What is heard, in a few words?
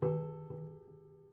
Prepared Piano